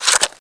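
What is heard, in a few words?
pickup, weapons; SINDEMO; ammo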